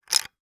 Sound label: weapon gun 1917; still north media